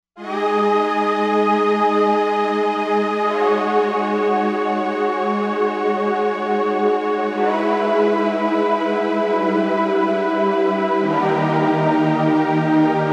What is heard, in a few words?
Pad; Riven Pad; Roland Fantom XR